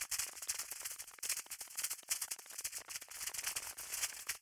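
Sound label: shock ready loop, magic, lightning; skyrim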